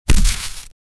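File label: skeleton destroyed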